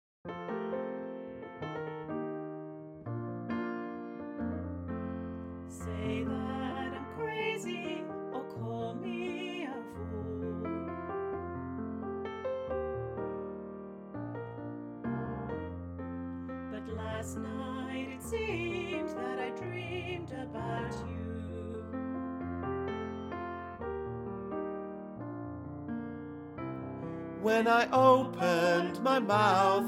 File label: BARITONE; Baritone Learning Track; The People's Show Choir